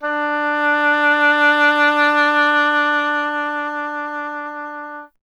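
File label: oboe, wind; Miroslav Vitous - Solo Instruments VOL-2; Solo lnst. II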